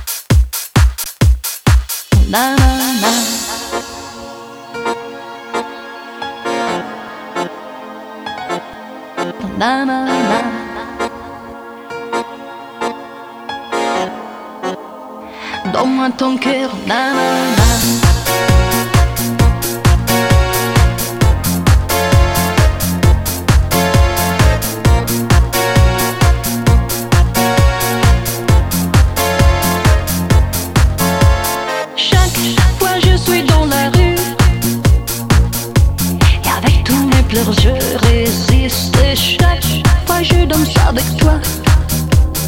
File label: Tango In Piano